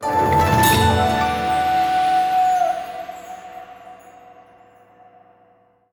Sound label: bonus Outro; imperialriches